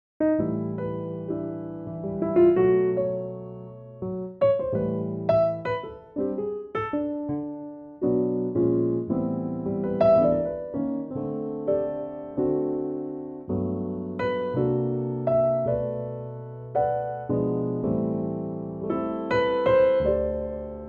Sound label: piano